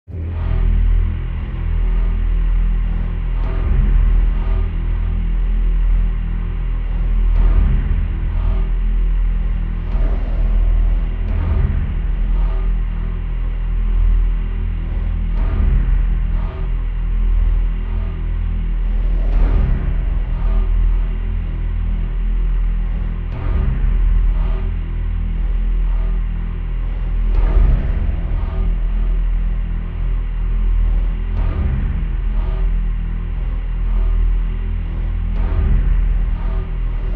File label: Drone Musical Evolving Dark Pulsing, No High Strings; Drone Dark Suspense Eerie No High Strings Texture Bed Atmosphere Ambience Pulsing; Drones; Sound Design